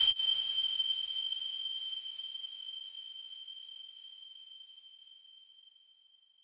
lead

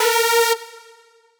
synth

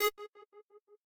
synth

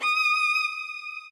solo

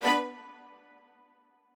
strings

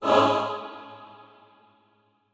chor

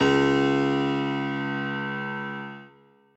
bass piano

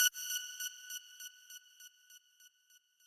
lead

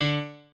piano

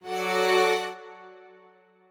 strings

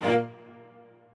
strings

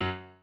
piano

